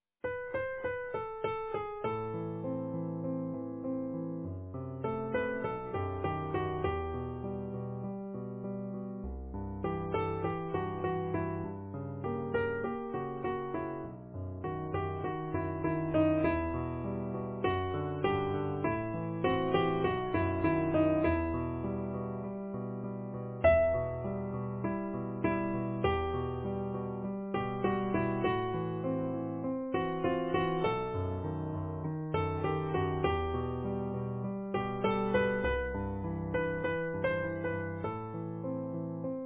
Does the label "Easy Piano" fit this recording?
Yes